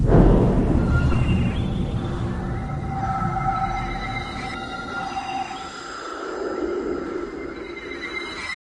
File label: Sucked Into Space